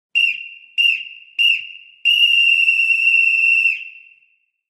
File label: referee's whistle; سوت داوری